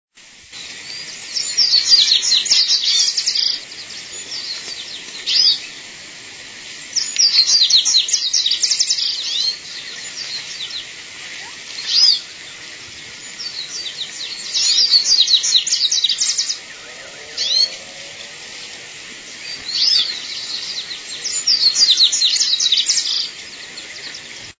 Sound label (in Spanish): voz corbatita común